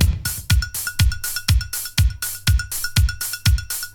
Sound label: 122 bpm 80s breakbeat e key